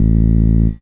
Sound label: SID Bass A; CHIPSHOP HITS